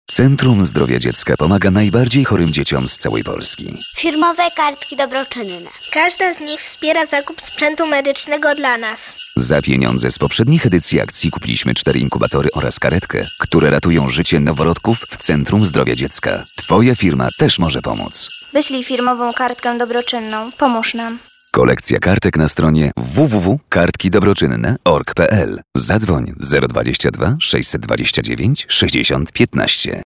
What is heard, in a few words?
bells; Spot - Radio Złote przeboje